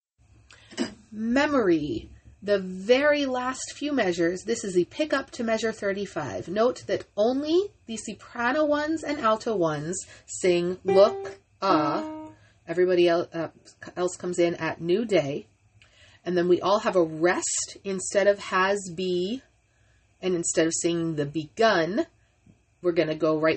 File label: memory all